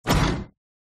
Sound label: door open